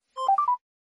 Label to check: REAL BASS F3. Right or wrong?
Wrong